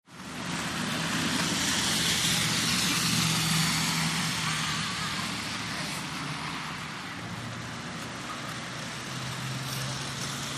City traffic in slush with bus arrive & depart.; TRAFFIC WET TRAFFIC: EXT B/G

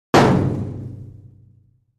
Dumpster Type 2 Hit with Sledge Hammer

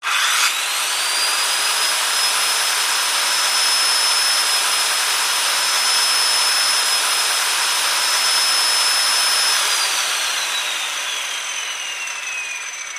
circular saw run; circular saws

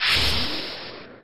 Missile Launch; Missile Launch 4

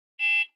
Button Alarm Beep, chimes; Button Alarm Beep Sh

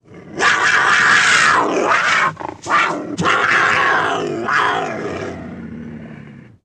Puma Cougar Roar Scre, big cats cougars

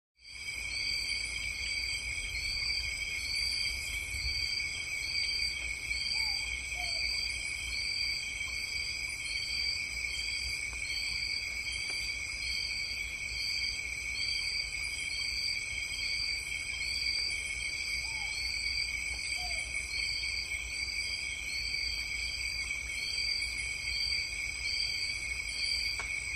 Night Jungle Dense, backgrounds

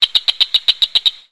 Frog Cricket Chirps

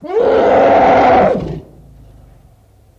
Elephant Roar Angry

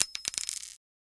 Screw Drop Bounce, nuts & bolts

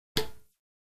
Badminton Soft Shut

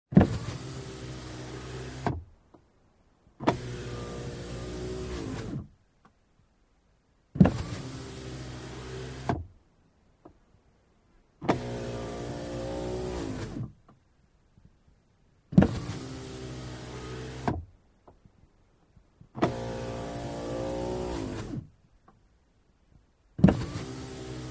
Car Window Up And Down, Electric; Interior Close Perspective.

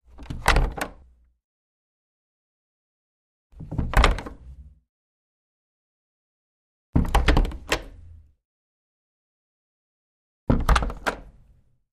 Door Wood Open, Close x4

